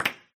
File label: click